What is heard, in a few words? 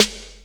Snares Electro